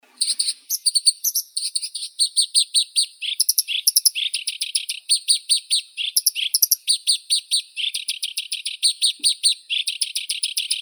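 black-browed reed warbler, Acrocephalus bistrigiceps; kamishovka chernobrovaya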